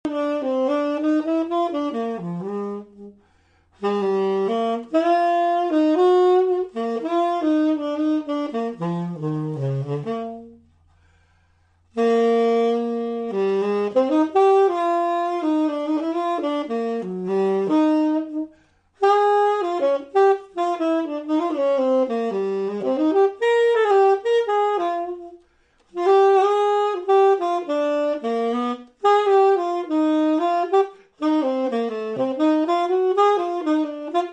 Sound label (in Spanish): Saxofón